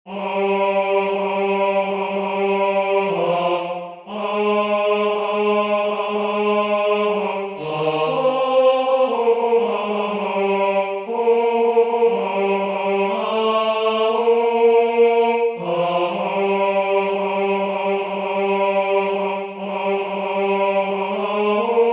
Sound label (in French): Priere du soir Tenor; Gounod